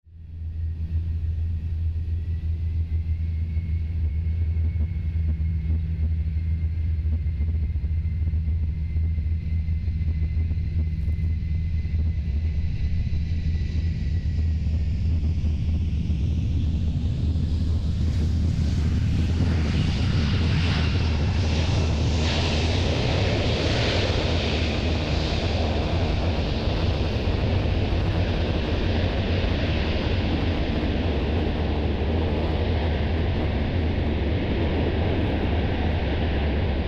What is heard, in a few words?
jet landing